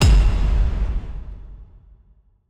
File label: Epic Metal Hit; soundscrate